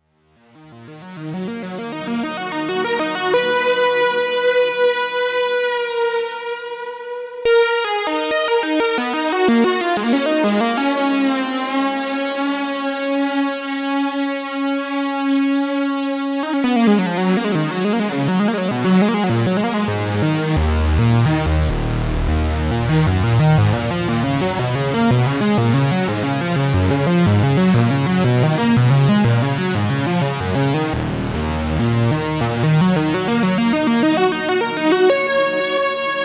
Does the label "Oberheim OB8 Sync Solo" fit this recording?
Yes